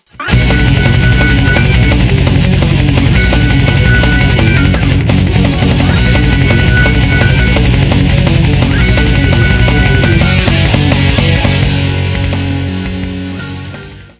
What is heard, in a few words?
Metal style short riff